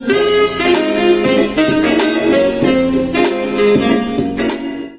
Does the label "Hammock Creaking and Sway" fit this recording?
No